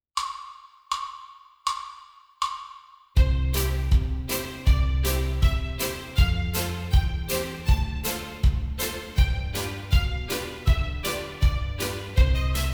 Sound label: Concert C Scale with Thirds